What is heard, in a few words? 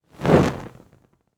Fire Torch Movement Slow Pass By; Fire and Explosions